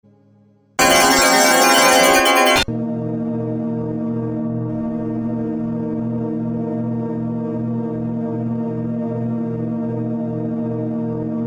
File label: drone